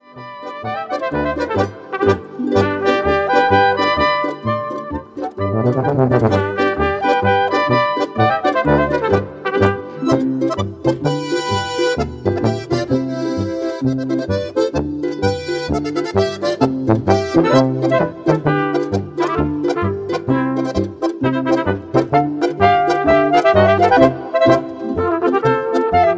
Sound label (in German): Ruperti Polka